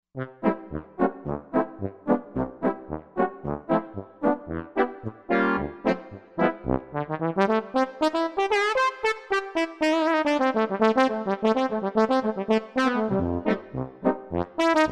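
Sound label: Brass Velo Trb Sect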